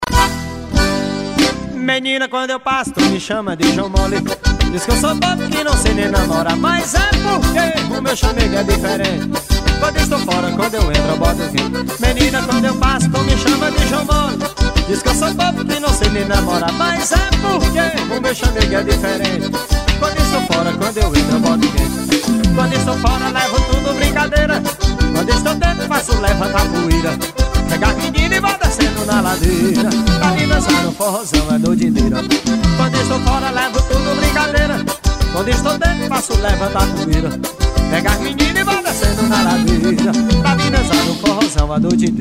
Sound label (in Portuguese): forró das antigas